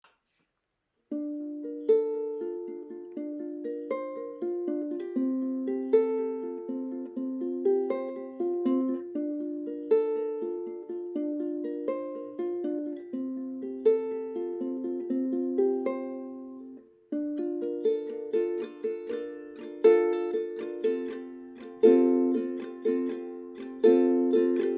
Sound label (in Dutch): opname massief houten palo santo uke